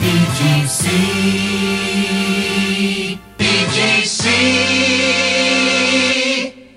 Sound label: jingles, originals resings comparison; pams series 42a